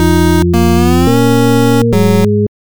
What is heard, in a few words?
victory